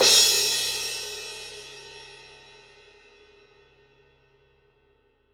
Crash

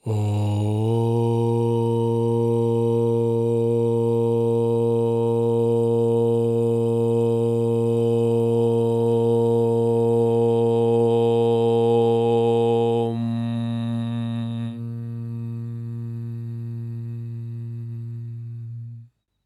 Om Aum Mantra Male Chant Bb

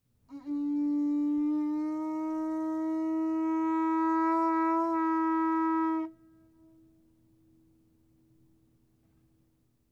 Conch

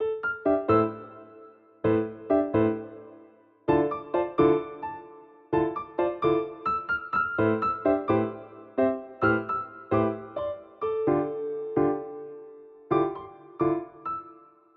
Piano Loop

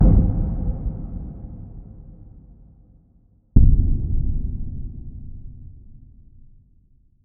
Cinematic Boom Impact

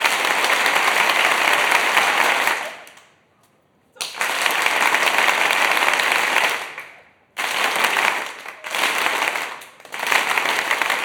Claping

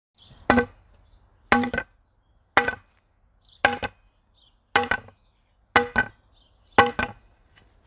Wood Hit Wood Metal Twang

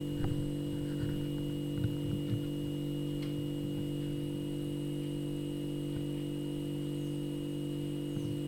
fridge hum

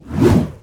Swoosh

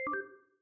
Pickup sfx

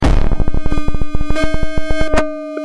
Thought Synth; Gab Extreme

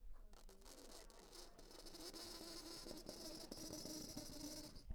Scraping Black Board

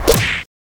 Woosh Punch

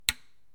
Cupboard latch click

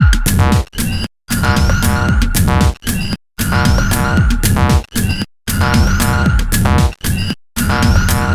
115 Bpm Stab Loop

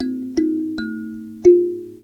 Indonesian Thum Loop

